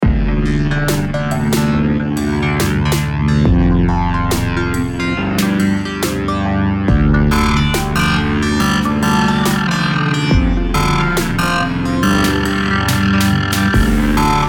Heavy Song

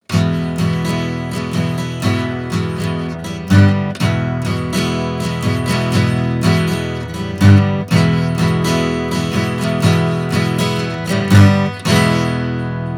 iRig Ac iPad Ibanez

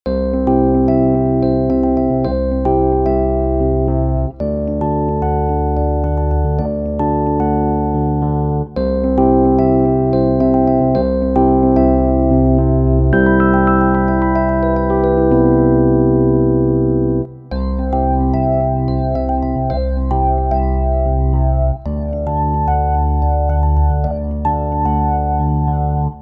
3x Rhodes 2x flanged

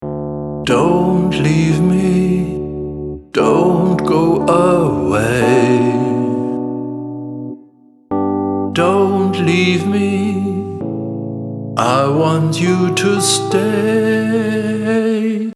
no correction

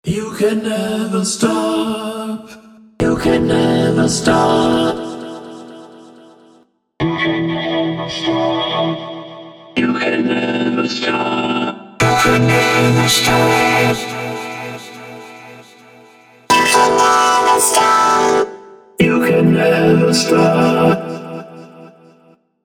Vocal Synth 2 MIDI; Elektronisch verfremdete Vocals

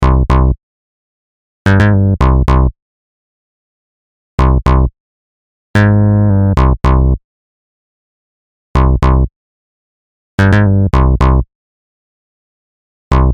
sub boom bass

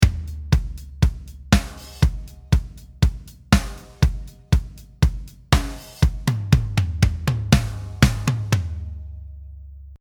Drums white

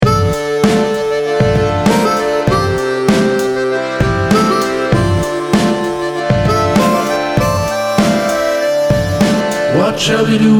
sailor song

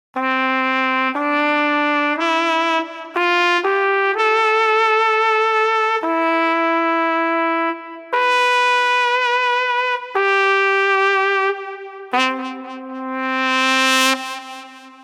flugelhorn solo

